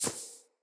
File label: Generic Pickup Auto